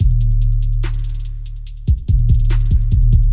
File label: 144 808 bass drop trill, drum loops and breaks; 141 to 160 bpm